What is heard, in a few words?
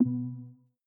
Pause Video; Peloton